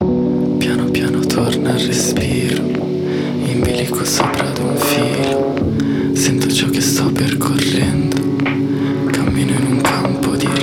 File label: Piano Piano